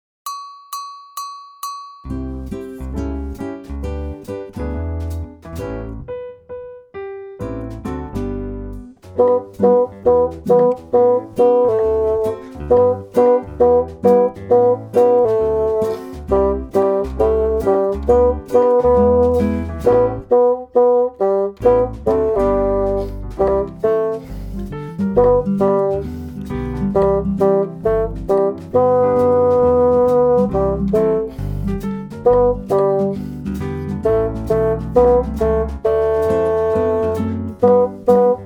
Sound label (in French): basson